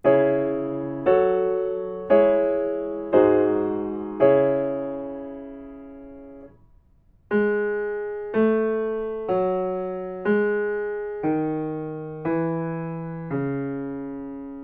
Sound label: melodic fragments; ear training